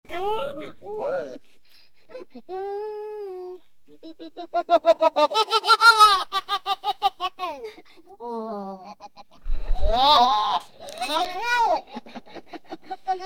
year old african, laughter; 12 year old african quebe

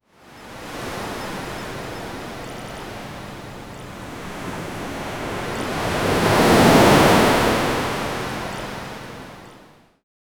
massive wave crashing on a beach

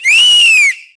volleyball

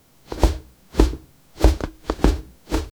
light basket movement, basketball

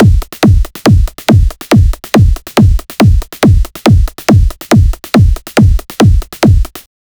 dance

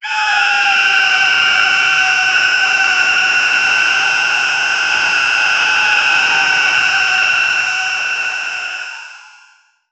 bionic scream a mix of; robotic

